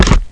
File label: Holster; SpacewardHo!